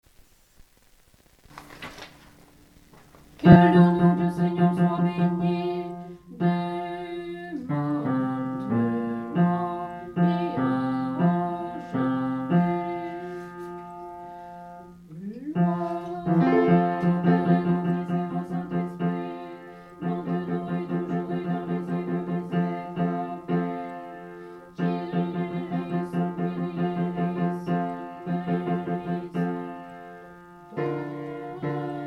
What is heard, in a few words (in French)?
basse; Que le Nom du Seigneur